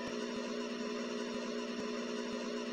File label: drive spin LOUD